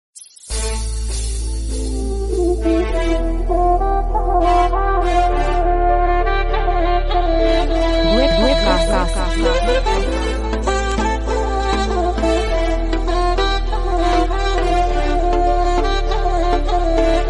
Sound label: music